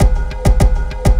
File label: Loop Bit, Processed Percussion; One Shots; Sonic Foundry (Sony Creative Software); Rhythmicronics